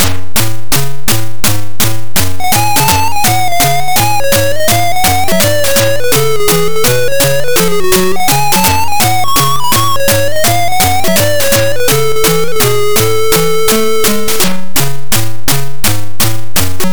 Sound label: HiScore; Black Lamp. HiScore (C64); CNGSoft